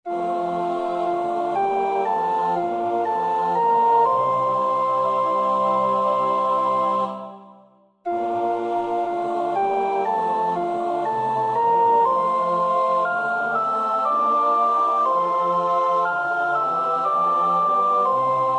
sopraan, koorpartij oefening; Reger (Max); 7 geistliche Volkslieder

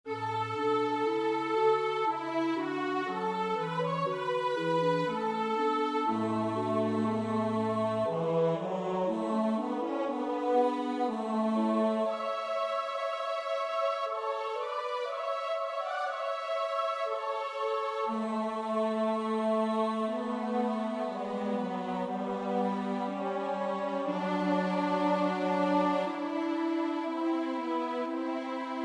orkest, S-A-T-B; Saint Saëns (Camille); Oaratoire de Noël

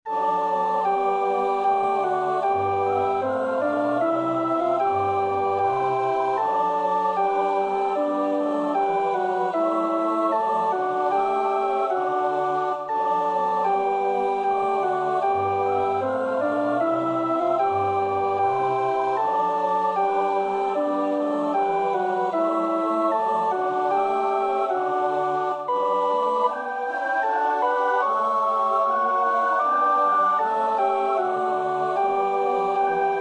alt, koorpartij; Bach (Johann Sebastiaan); 9 So kommet vor sein Angesicht

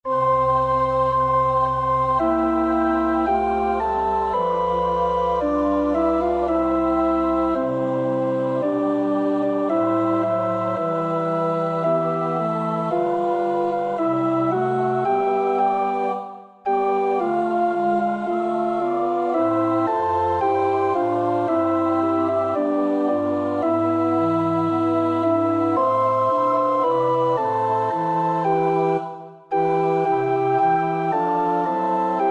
alt 1; Rheinberger (Joseph); Passionsgesang op.46

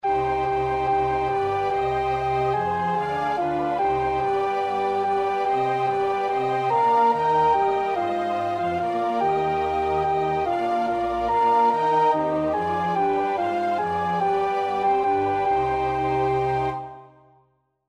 alt, koorpartij oefening; Purcell (Henry); Dido and Aeneas

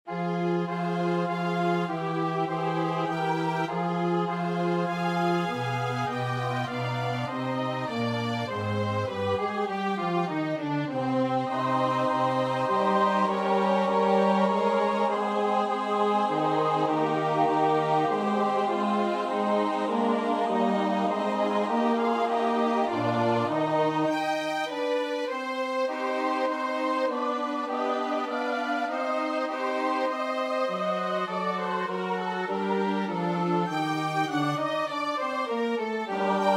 S-A-T-B, koorpartij oefening; Durante (Francesco); Litanie della Beata Vergine

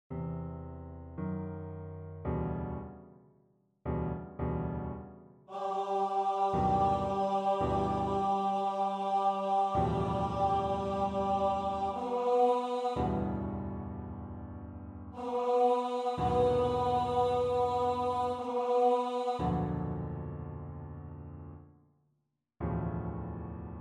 sopraan 2; Liszt (Franz); via crucis

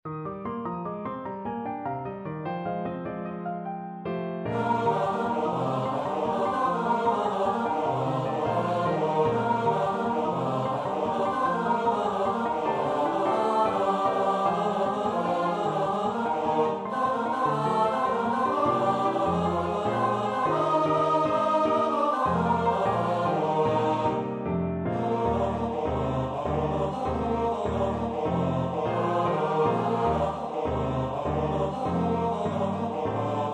S-A-T-B, koorpartij oefening; Bullard (Alan)

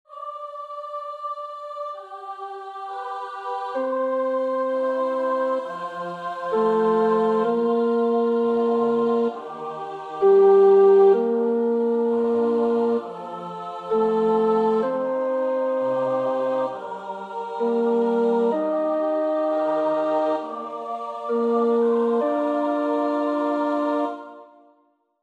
tenor, koorpartij oefening; Schütz (Heinrich); Matthäus Passion SWV 479

